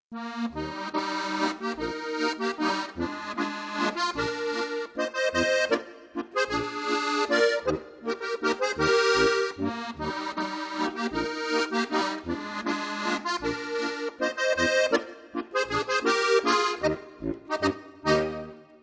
walzer

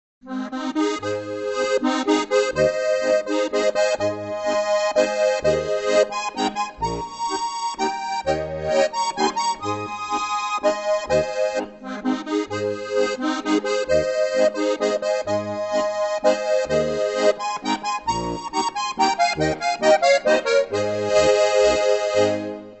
walzer

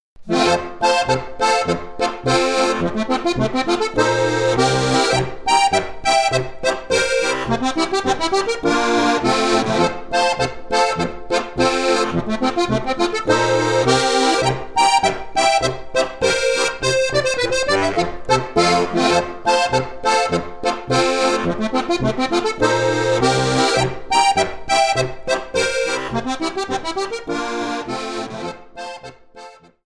boarischer

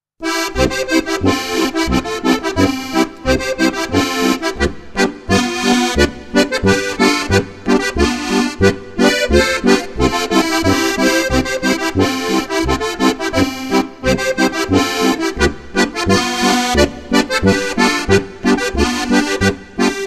boarischer

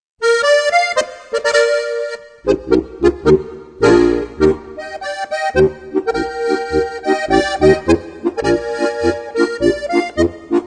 Schlittenglocken Polka

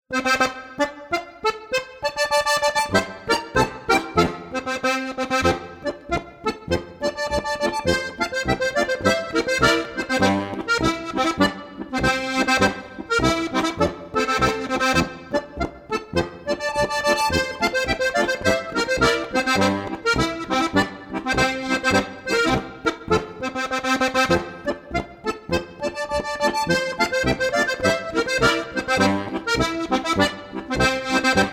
harmonika

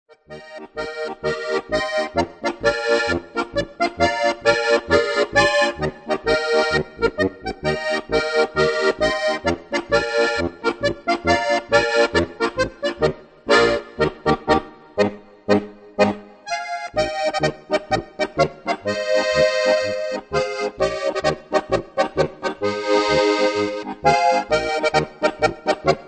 polka

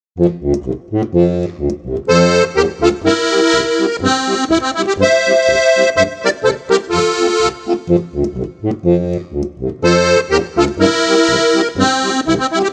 bass polka

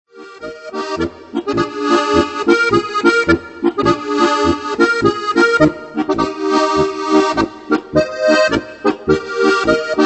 Polka

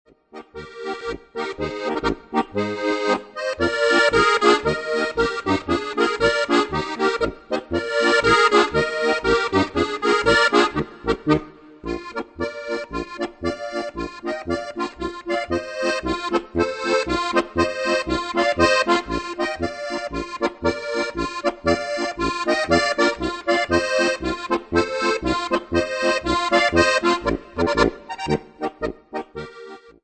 typisch steirisch

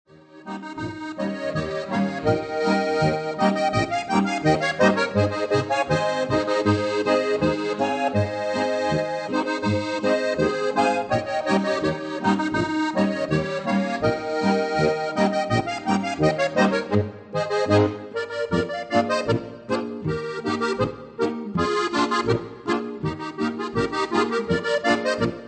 boarischer; Harmonikastückl aus Bayern

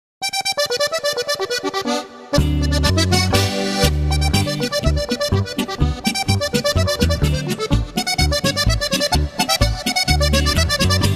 riedbach polka

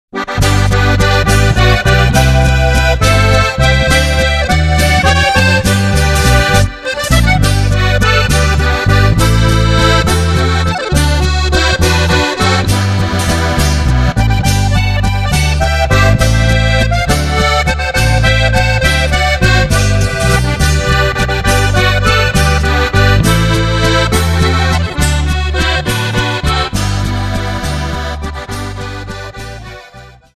steirisch 3/4

